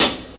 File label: JAZZ HH CL, JAZZ DRUMSET